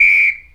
Referee Whistle, Sports & Recreation; Sony Pictures Sound Effects Series

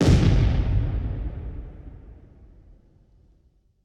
WWII Cannon Blast, Period Combat; Sony Pictures Sound Effects Series

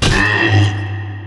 Pain

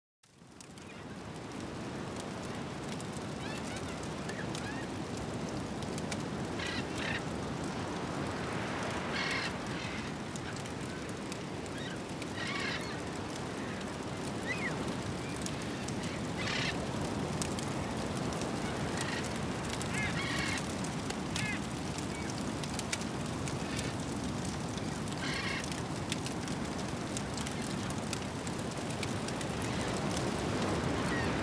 Ambient

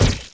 Melee Hit

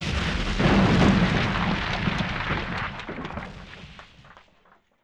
Explosion Rocks Debris; Weapons & Explosions; Sony Pictures Sound Effects Series

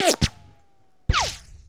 Rico & Whiz By Multiple; Sony Pictures Sound Effects Series; Ricochets - Hits - Whiz Bys

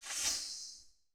Zing; Title Sequence Effects; Sony Pictures Sound Effects Series

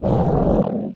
Angel Pain

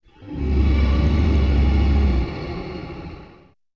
Effected Groan, Vocals - Monster; Sony Pictures Sound Effects Series

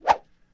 Arm Whoosh Quick, Hand To Hand Combat; Sony Pictures Sound Effects Series

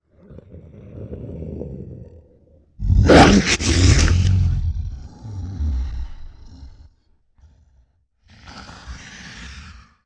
Hybrid Growl, Vocals - Monster; Sony Pictures Sound Effects Series

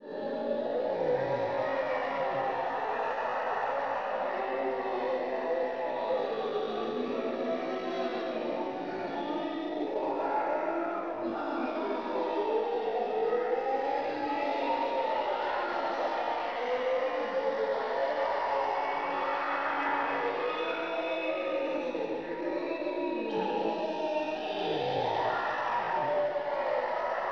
Wails In Hell, Vocals Monster; Sony Pictures Sound Effects Series

